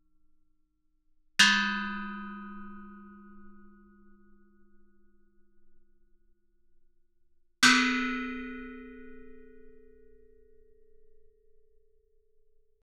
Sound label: Gamelan; Propeller Island - Complete Gamelan